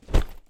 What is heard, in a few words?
dump bag